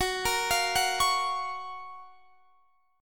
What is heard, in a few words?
F sharp Major 7th, Guitar, Standard; arpeggiated; JGuitar